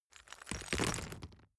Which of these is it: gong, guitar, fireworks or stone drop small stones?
stone drop small stones